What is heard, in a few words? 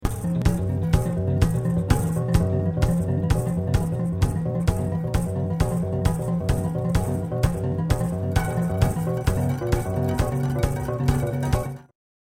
Corde Preparate Martelletti Prepared Strings Hammers